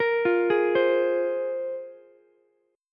Bb 7th Suspended 2nd Bb Ukulele, chord; Bb7sus2 arpeggiated

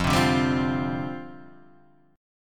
F 6th Suspended 2nd F

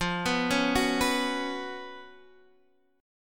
F sharp Major 7th Suspended 4th

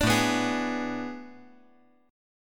A Major 7th Suspended 2nd Suspended 4th

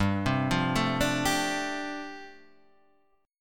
G 7th Suspended 2nd Suspended 4th, G, chord; x,x,5,5,5,4,4,4; down

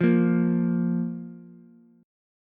Bb 5th Bb Ukulele; Bb5 strummed

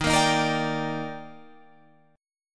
Eb Major 7th, Guitar Drop D

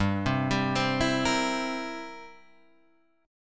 G 6th Suspended 4th Sharp 9th, guitar chord; G6sus4#9 arpeggiated; JGuitar

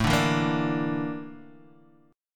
A sharp Major 7th Flat 5th A

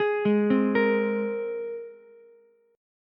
G sharp Major Add 9th, Ukulele; arpeggiated; 13,8,8,13

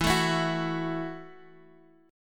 F sharp Minor 7th E

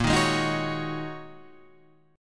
Ab 7th Suspended 2nd Suspended 4th, chord

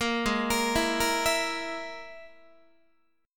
G sharp Suspended 2nd Sharp 5th, Guitar; G#sus2#5; arpeggiated; JGuitar

